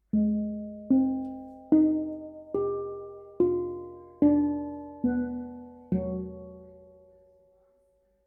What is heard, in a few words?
hang; ZOOM